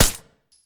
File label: kedr silencer, weapon